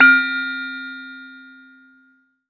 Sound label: Glocken C4; Korg NS5R